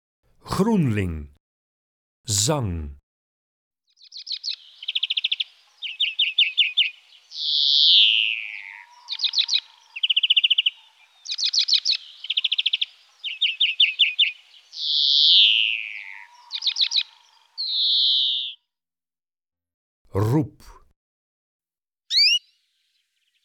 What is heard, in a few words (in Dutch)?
Groenling, Zangvogels; IVN